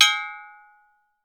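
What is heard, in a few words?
AFRICAN AGOGO; Voice Crystal - Steve Reid Definitive Percussion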